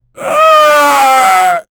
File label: zombie jump scream